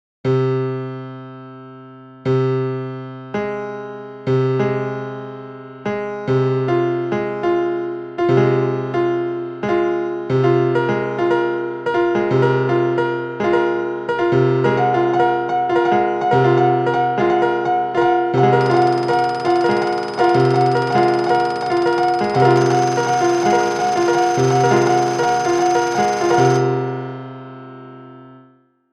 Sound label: Melody C3; KEPLER90